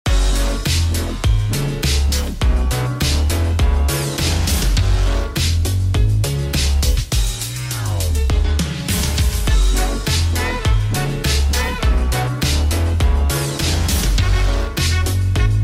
electro swing; fortnite

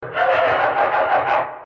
phone laugh

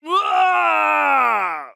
impaled scream; mk9 liu kang

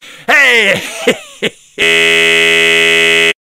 eh heh heh heeeeeeeeeeee; tf2; sniper

